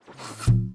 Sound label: Tooltip In; Worms3D